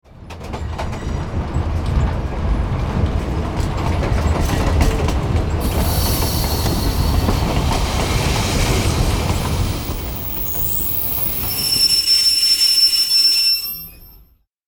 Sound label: Train approaching station decelerating arrival; vehicle